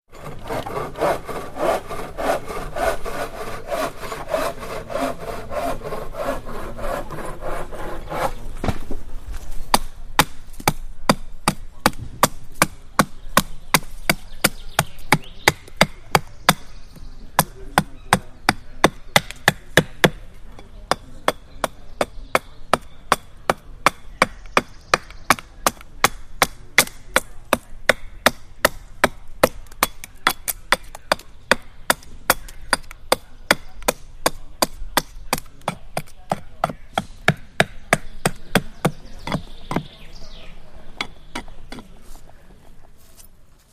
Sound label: Carpenter Mending Cart; Starts With Short Amount Of Sawing, Then Hammering Nails Into Splitting Wood - Occaisional Murmers And Background Birdsong